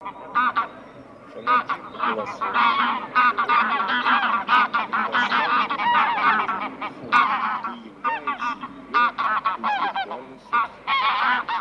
Гуменник Oca Granaiola; plurifon

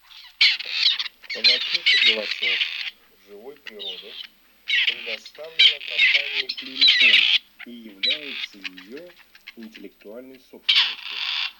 Куропатка серая Starna

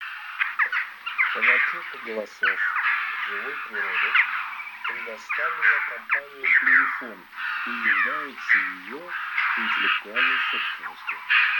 Клушица; plurifon

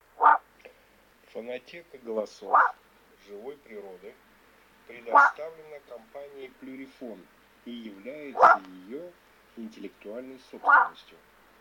Лисовин Volpe maschio; plurifon

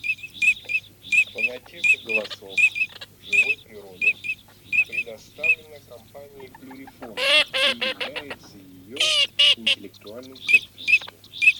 Чирок-свистунок Alzavola; plurifon

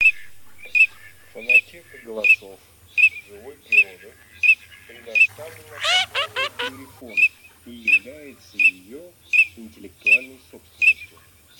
Чирок-свистунок Alzavola; plurifon

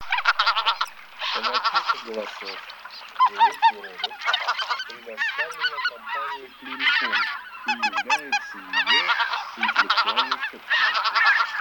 Белолобый базар Oche Lombardelle; plurifon

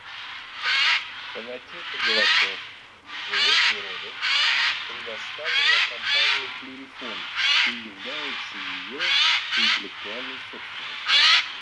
Сойка Ghiandaia; plurifon

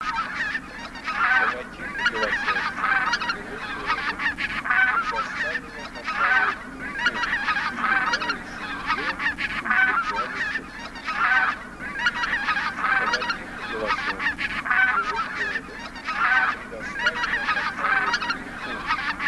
Гусиный базар

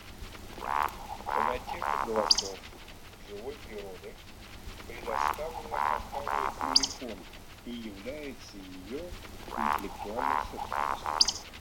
Вальдшнеп Beccaccia; plurifon

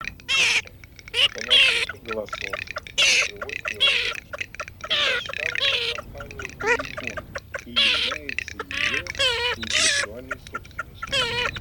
Чирок-трескунок Marzaiola

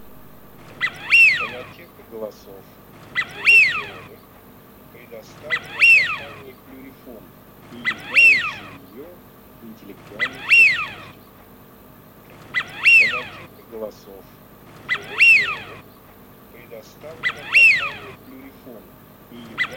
Свиязь Fischione; plurifon

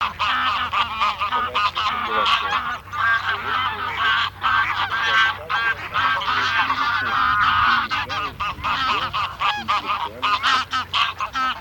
Гусь серый базар Oche Selvatiche

